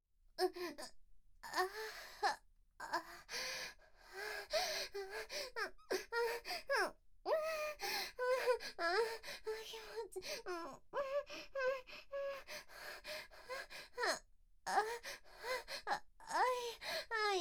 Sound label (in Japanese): 挿入 喘ぎ; SEなし